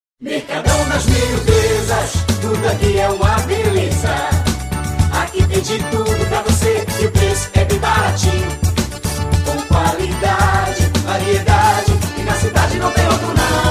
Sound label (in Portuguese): jingles forro supermercado loja